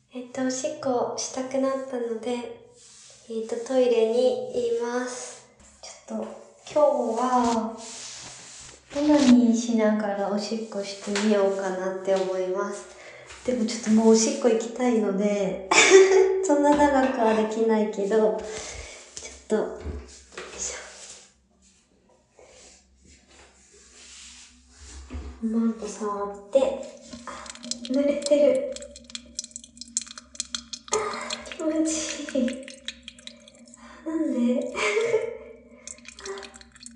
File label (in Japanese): おトイレで! オナニーしながら! オシッコですッ!